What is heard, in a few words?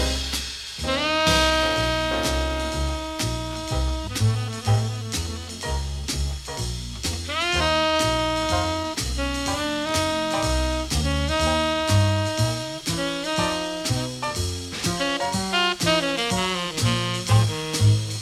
swedish jazz quartet